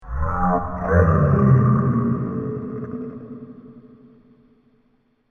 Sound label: Grizzly Hell Bear, monster sound effect; fantasy sound effect; Andrew Fleming; Twistedtracks